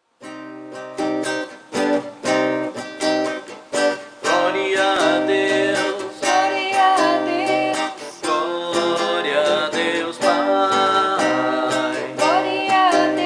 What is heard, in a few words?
vocal